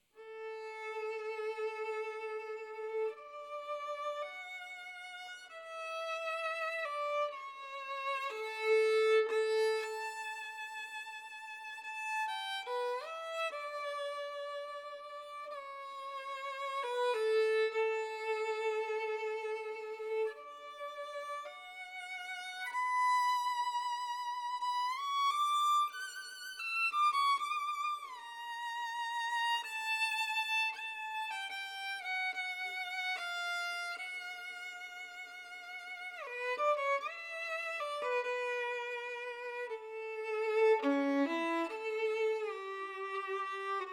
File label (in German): Maggini Violine